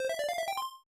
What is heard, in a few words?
Item Chime; Heart; Flintstones The Treasure of Sierra Madrock; SNES